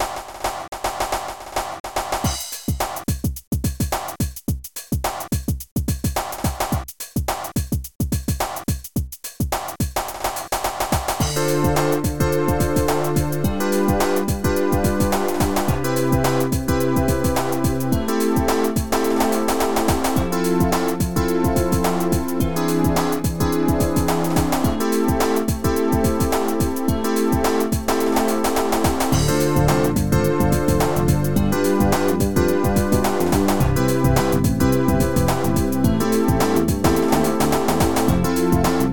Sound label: Groove